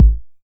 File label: 909 BD; Goldbaby Tape808 & Tape909; The Tape 909